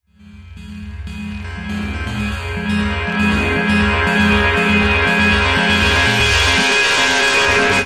Space Bell Ring Build

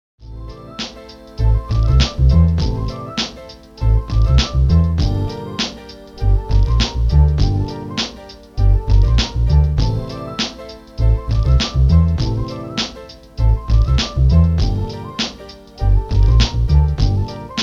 Flute Beat

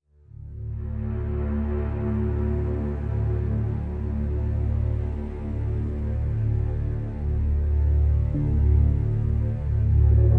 D minor No Guitar

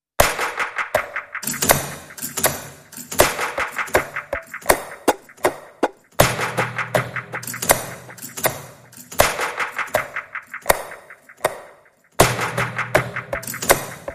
Slow, Hits Only

